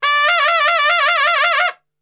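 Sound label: D trumpet shake; Best Service; Brass Super Section; TRP SHAKE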